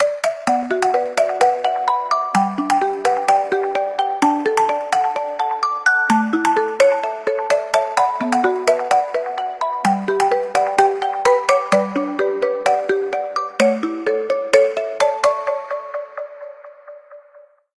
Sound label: Marimba, ringtones; Honor; MagicOS 7.1